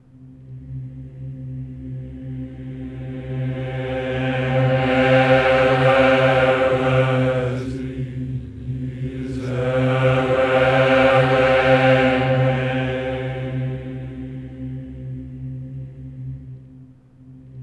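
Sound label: OCT reverse, Voices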